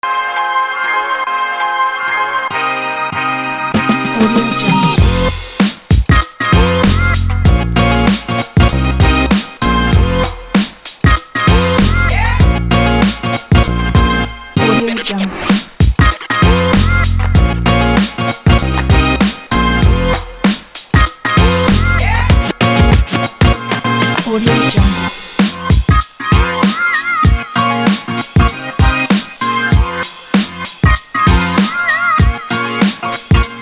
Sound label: hiphop